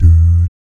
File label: PERC, BASS PERC, Vocal Planet; ILIO; Jazz & FX